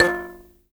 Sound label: HIBZ KL F 4; Heart of Africa